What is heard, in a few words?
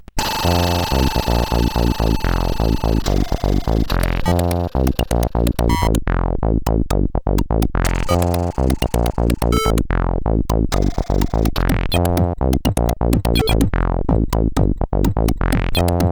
Funky Punky, CSG8580R5